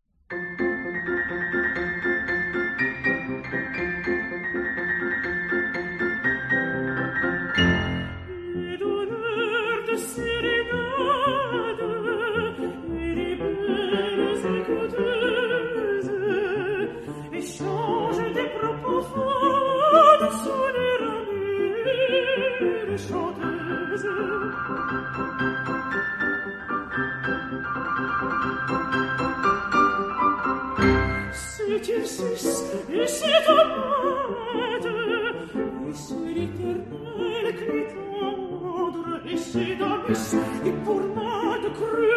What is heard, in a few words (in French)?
Fetes galantes Contralto; Renaldo Hahn